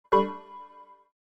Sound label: Microsoft Windows 98 Error